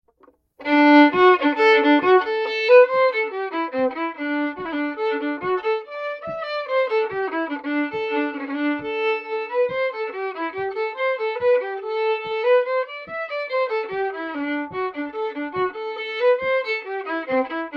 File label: Toss the Feather